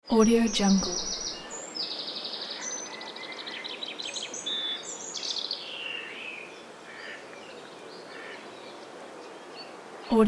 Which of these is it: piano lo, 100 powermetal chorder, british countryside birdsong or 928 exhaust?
british countryside birdsong